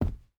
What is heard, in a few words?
footstep rubber boots cloth jump land; Rust